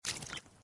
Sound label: Fishes; Hero in the Ocean 2